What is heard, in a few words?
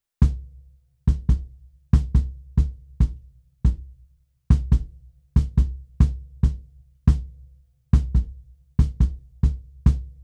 Kick without Comp